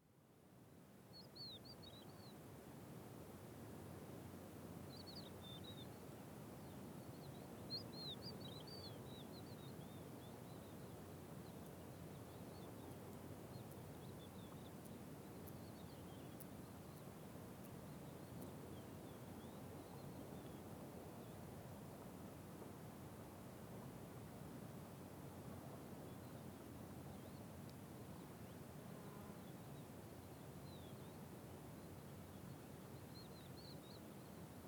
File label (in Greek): Στάση βιοποικιλότητας Άνοιξη; Στάση βιοποικιλότητας Άνοιξη (ΗΧ); View